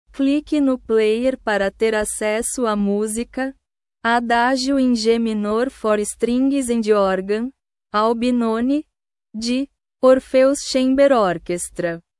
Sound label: adagio in g minor for strings and organ; albinoni